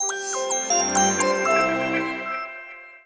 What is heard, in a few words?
Power On; sgh-d720; Digital